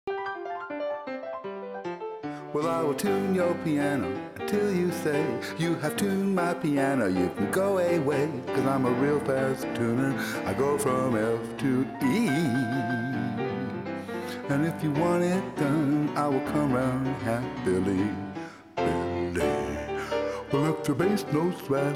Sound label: Piano Tuners Lament